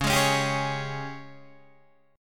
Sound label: Ab Major 7th Suspended 4th Db